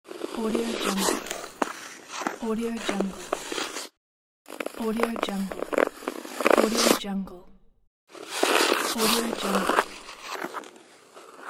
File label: skating; kaelv